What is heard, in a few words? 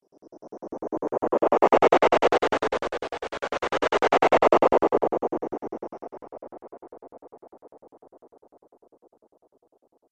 Slow Double Ripple Zoom, whoosh